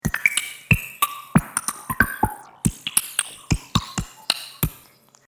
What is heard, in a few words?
mouth swing